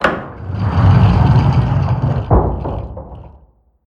Upper Room Shades Close

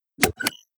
Select Button

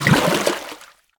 Deep Water Walk; moul

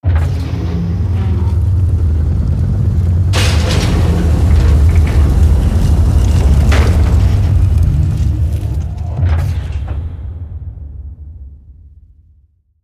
Ball Hall Bridge Door

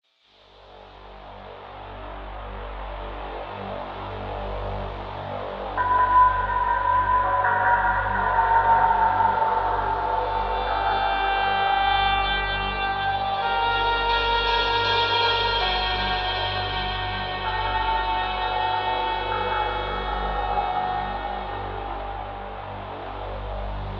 Music Player; moul

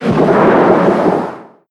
Fumerol Mfld Blast

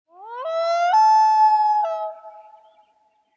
Rand Bird

